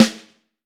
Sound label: 5X14 Snare Drum